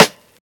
sparkle acoustic snare a key, Royality free acoustic snare sound tuned to the A; snare-drum-samples